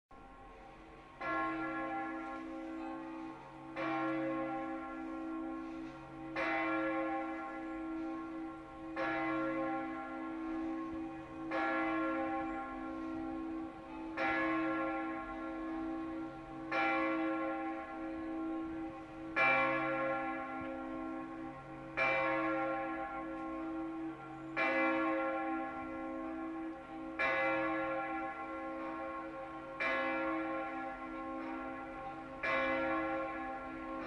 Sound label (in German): Glocken Opole Kathedrale